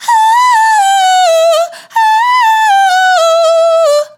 vocal syllable one shot operatic "ooh ooh" adlib dry 128 Emin